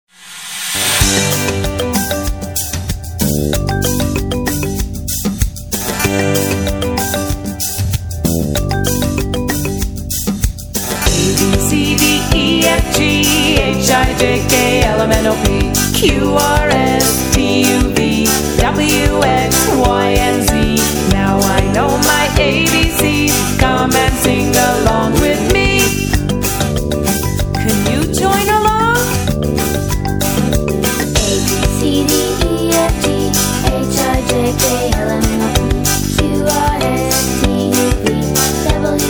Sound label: Alphabet Song